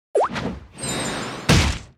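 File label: attack; hero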